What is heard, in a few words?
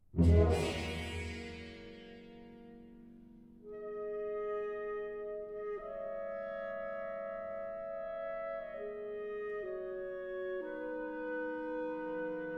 symphony for baritone and orchestra